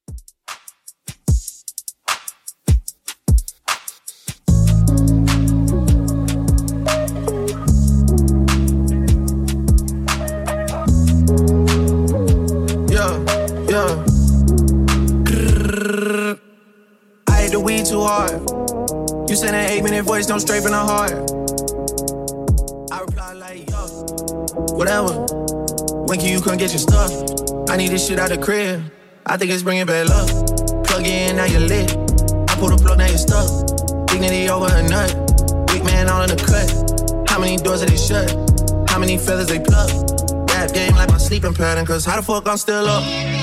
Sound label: Top40 Redrum 75bpm